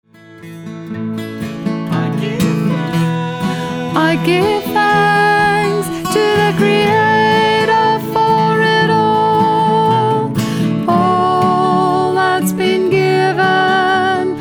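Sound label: Peace Choir Hi harmony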